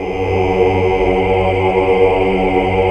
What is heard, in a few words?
HAL AAH. AAAH Uni